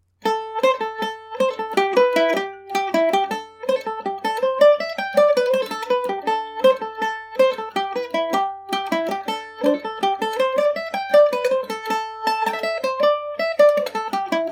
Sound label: A minor, irish mandolin; castle kelly